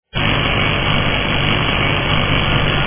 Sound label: omega